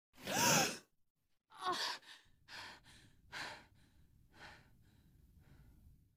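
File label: Fright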